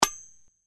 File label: pick; Dreamcatcher